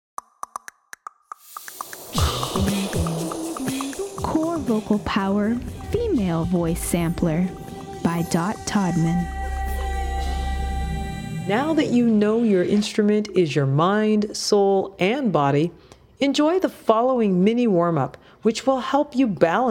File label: FEMALE VOICE